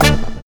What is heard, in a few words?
HIT, Hits; Houseworx